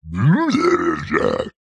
cartoon monster gremlin troll crazy grunting vocalisation